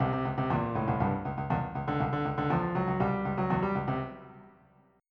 Funk

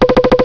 plup

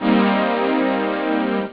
nuke, synth; OctaMED SoundStudio V1

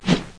Swish Lo

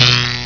Humm; RivalBall for Macintosh

